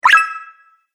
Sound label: Squeaky Jump Game Fx; Video Game Sound Effects